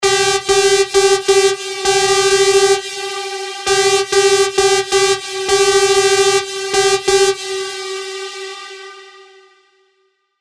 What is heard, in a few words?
Grinder, SERUM